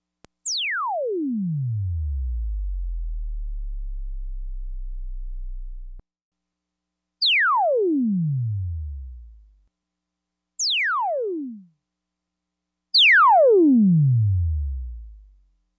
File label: Sol 4 sweeps down